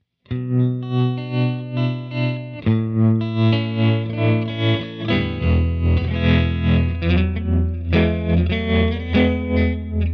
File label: Tremster Vibrato On